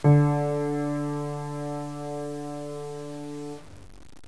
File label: piano d